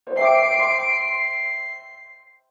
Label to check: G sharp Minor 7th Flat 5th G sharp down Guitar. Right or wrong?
Wrong